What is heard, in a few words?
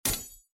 sword Metal; Out of Reach: Treasure Royale